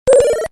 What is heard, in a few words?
power up